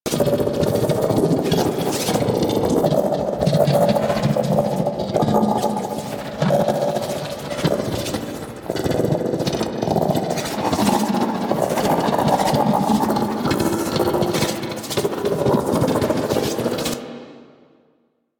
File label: Pulse Projectile Loop; DE; Warframe; Warframes; Gyre